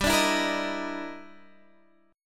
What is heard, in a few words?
G Minor 6th Flat 13th G